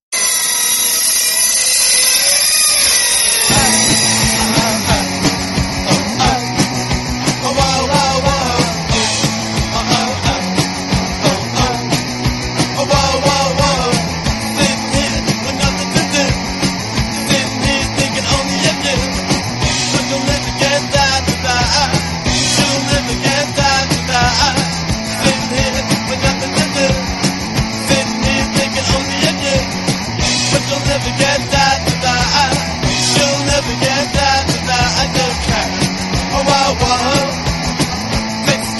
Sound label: Chain Saw; Rock